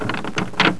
key

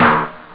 timbale; OctaMED SoundStudio V1

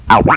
Best beep ever really!!!

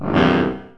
wrong

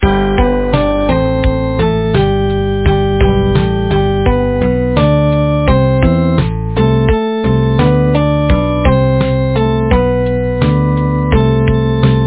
music; pisqorky

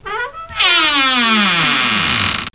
hinge; Total Chaos Nukem 3d Pack; duke

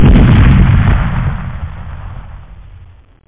BLOWUP

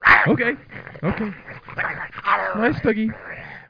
nice dog

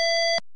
ALARM

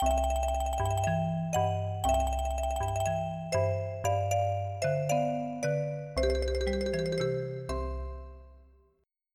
Marimba

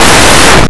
box; Blue Heat

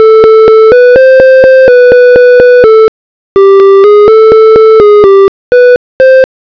big

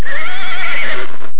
horse

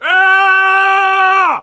SCREAM M; RedLine demo

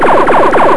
HEARTBEA; Easy Personal Web Page

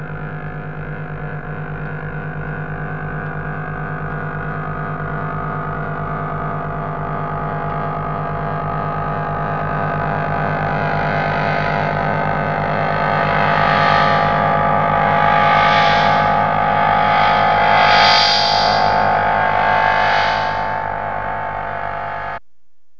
scifi; SoftKey Entertainment Pack; SoftKey Multimedia

